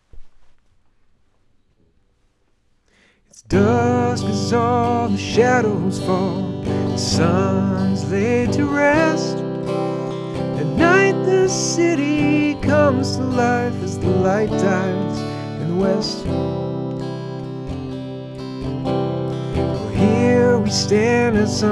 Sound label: absent minded melody march